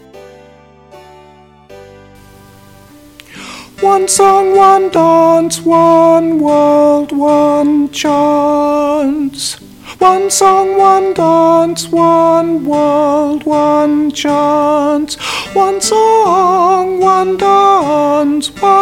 Alto vox

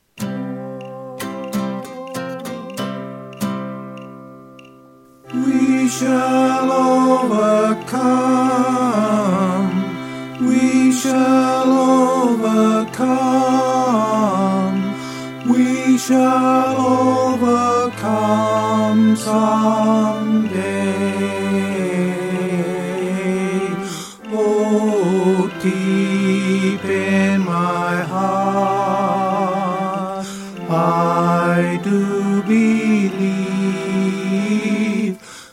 tenor 2 sung

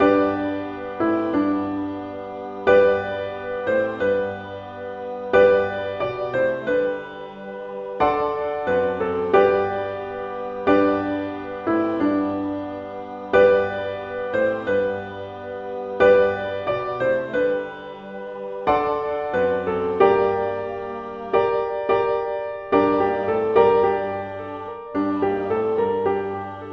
bella ciao melody with intro

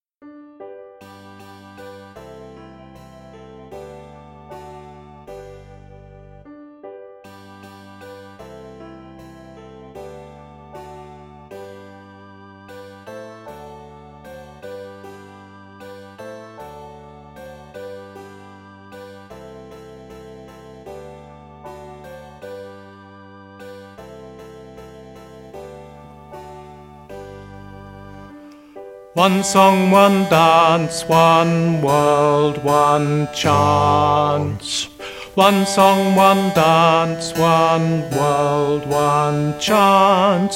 one world bass vox

